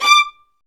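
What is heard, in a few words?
Violins Marcato; Roland LCDP13 String Sections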